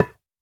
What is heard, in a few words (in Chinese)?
骨块破坏声